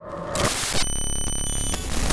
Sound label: Fx Reverse Interference; MatiasMacSD; Affright